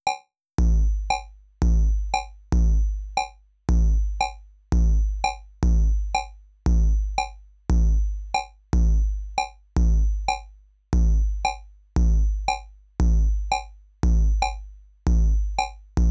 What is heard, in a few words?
drums